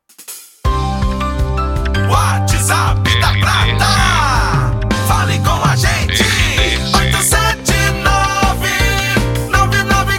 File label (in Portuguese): WHATSAPP; Prata FM 2021